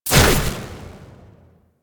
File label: Amp Chassis Fire, Amplifiers